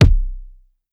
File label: wet kick single shot c key